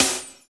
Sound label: SNR GLASS, snares; Roland L-CDX-01; Snares 3; Sn Modules 3